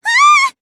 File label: ahhh; kaede